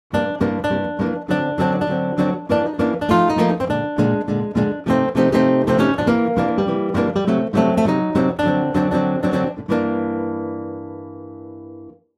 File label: guitar; pianoteq8